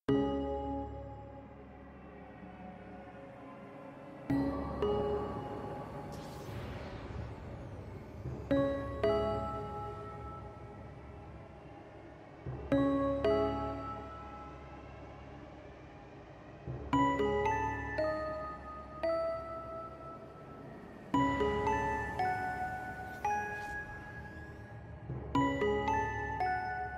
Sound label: Futuristic Music